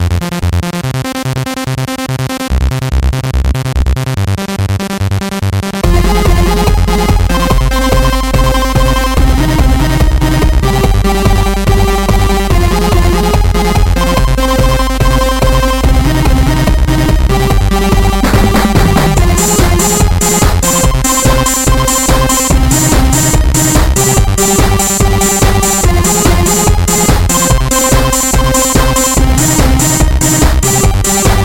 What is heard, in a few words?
Disco Ringtone